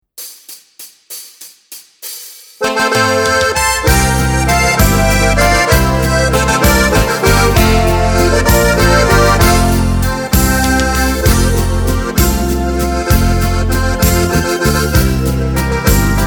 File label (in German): Karaoke ohne Chor